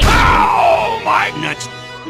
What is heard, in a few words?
death